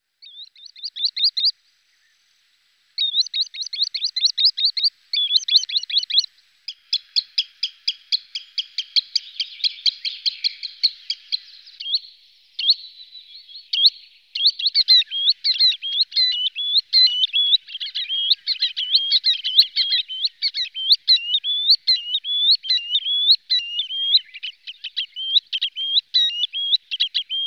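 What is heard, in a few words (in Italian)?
piro piro culbianco, Canto degli uccelli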